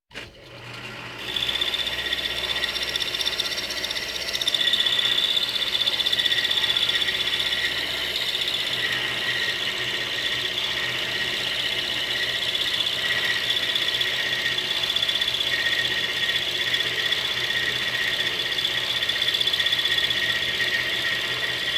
Walking Machinery Trucks

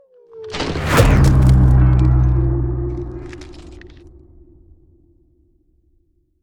Ice Spell Whoosh, Cold Whooshes; Blizzard Surge; Arctic Aura

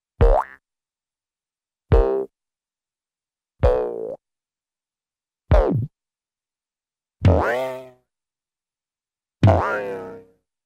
Short Synthy Low Pitched Boings, Cartoon; Hollywood Edge; Cartoon Trax

